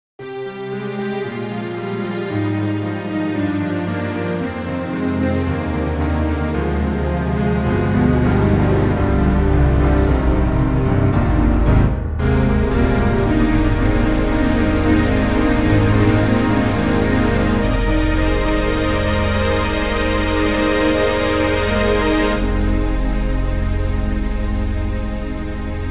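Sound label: KORG KRONOS 2 Piano Strings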